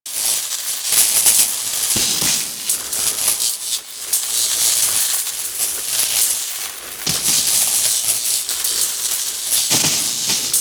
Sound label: Asteroid Sparks Loop; Warframe; Ambience; Grineer Asteroid